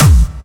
Hard Trance Kick

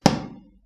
High Timbale

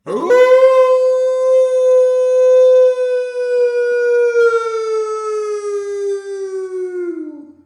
Big howl Wave

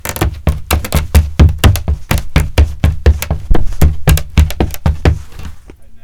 Running On Wooden Floorboards

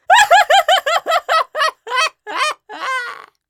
Witch Cackle

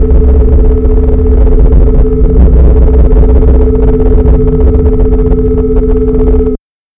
No Signal

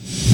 Reverse Kick

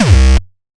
Hard Kick

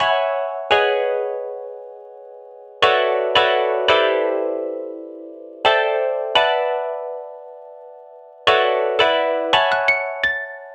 Wurlitzer

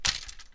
Dropping Sword

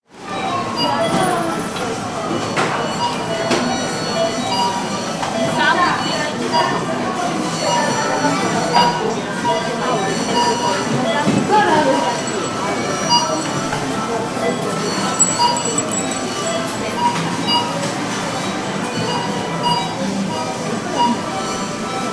Supermarket Saturday Noon

